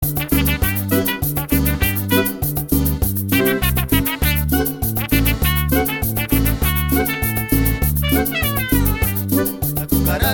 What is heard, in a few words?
La Cucaracha, mexican mariachi band